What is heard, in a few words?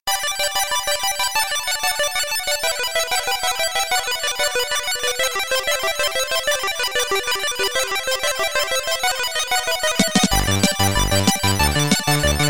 8bit; ghosties; children